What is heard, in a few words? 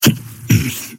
efforts